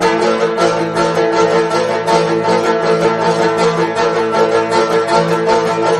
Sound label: banjo; music